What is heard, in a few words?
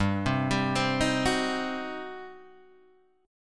G Major 7th Suspended 4th Sharp 5th, G; GM7sus4#5 Chord {3 3 5 5 4 2} arpeggiated